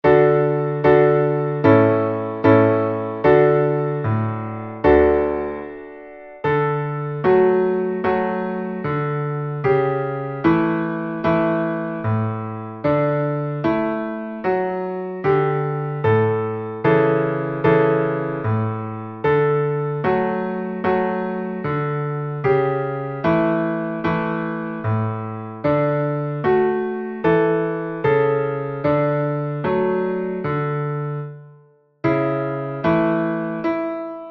Haenschen klein, langsam, Klavier

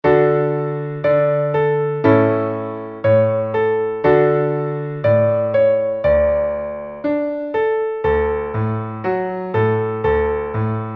langsam Klavier; Es regnet es regnet

